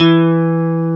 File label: GUI STRATO, E-Guitar; E-GUITARS